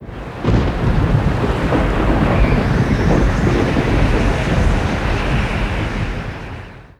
Rolling Thunder with Wind

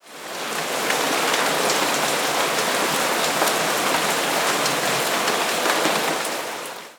Rain Heavy

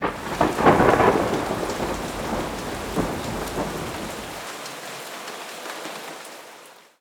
Rain Heavy with Thunder

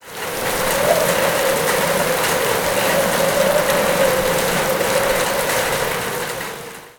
Rain Moderate with Sleet and Wind

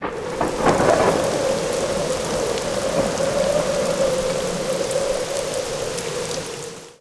Rain Moderate to Hvy with Thunder and Wind

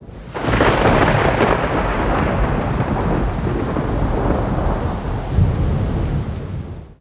Rain with Rolling Thunder

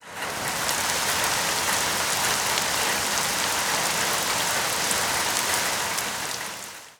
Rain Moderate to Hvy with Sleet

